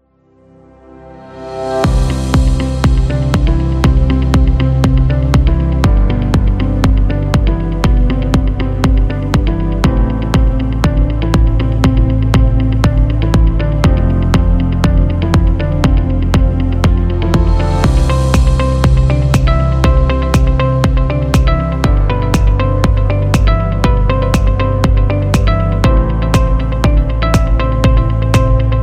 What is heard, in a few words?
A Corporate; corporate background